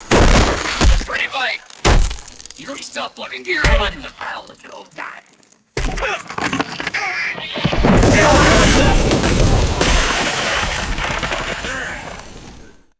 heavy ice strikes and falling debris; sports

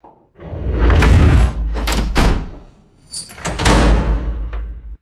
huge reverberant safe door slams

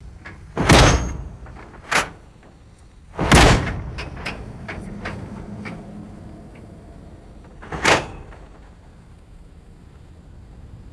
heavy metal mine car door closing; doors

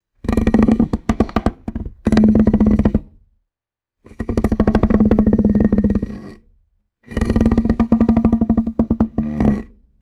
low friction mallet groans on glass

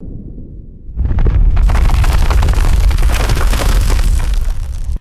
deep earthquake tremor and crumbling rock debris

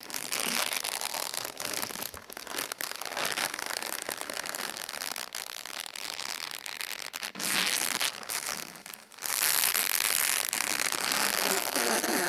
squeaky styrofoam bag rustling and crumpling; plastic

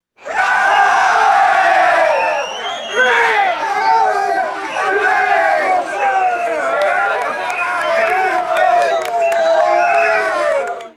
rowdy crowd cheering and whistling

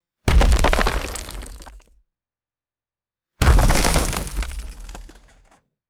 heavy rock and rubble impacts

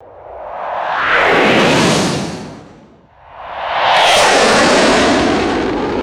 extremely fast jet ripping pass bys; aircraft

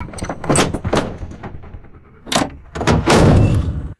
heavy metal helicopter door opens and closes; aircraft

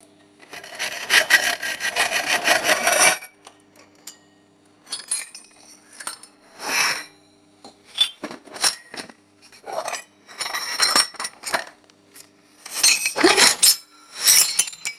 sweeping pottery shards into metal dustpan; destruction

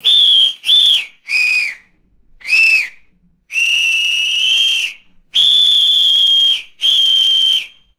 short double bursts of a referee whistle

